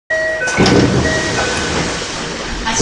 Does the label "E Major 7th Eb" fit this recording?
No